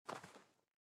step sand

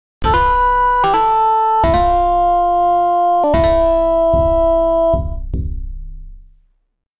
Victorious Battle; Samurai Spirits; Mega Drive

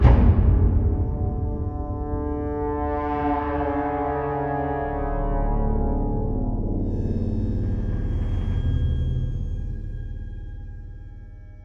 Scrap Ambience

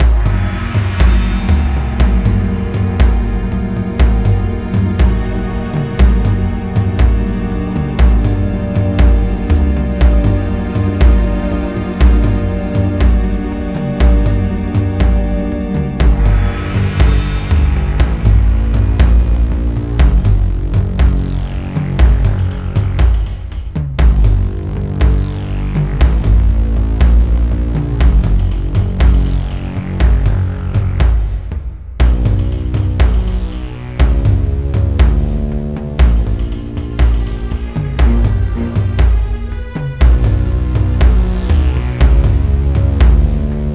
Btl Procwar drums Full, soundtrack; treasure planet battle at procyon

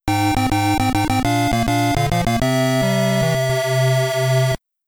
STAGE CLEAR (PC-8001); New City Hero

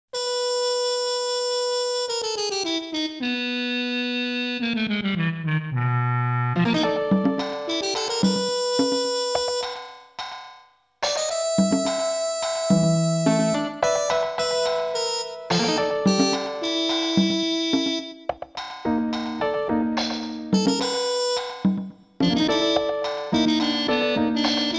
Suspense; Daikaijuu Monogatari 2; The Magical Land Shelldorado

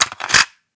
Remove Cassette

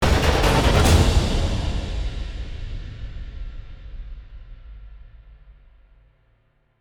Arena Fight Success Stinger

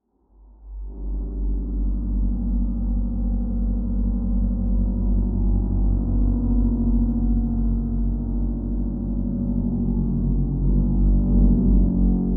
eerie bass; Among the Sleep

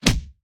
Enemy Knock Out Start

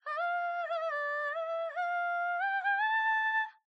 Kulning

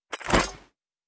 Pick Up Parasite Stone

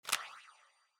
Phone slide

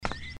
The Sound Of Footsteps; Dude Theft War; Android

